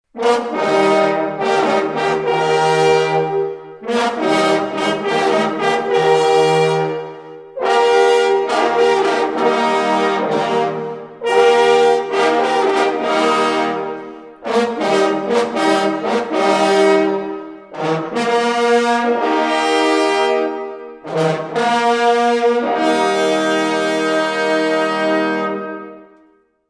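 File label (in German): STEINWILD TOT Jagdhornbläser; Schnals; Similaun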